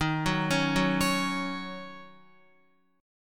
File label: D sharp Minor 7th, Guitar; arpeggiated; JGuitar